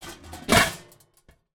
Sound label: household bbq metal lid place down on bbq